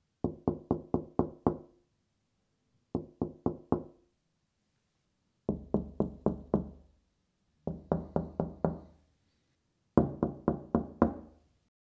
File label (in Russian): plast okno stuk